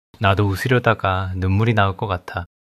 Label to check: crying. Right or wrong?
Right